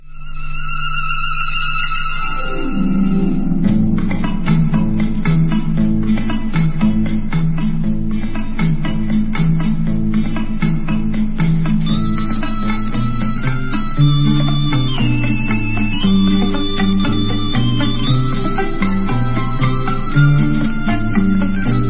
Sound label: instrumental